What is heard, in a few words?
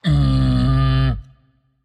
tongue; smite; voicepack; set